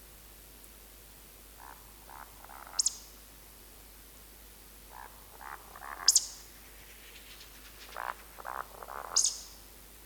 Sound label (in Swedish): morkulla Scolopax rusticola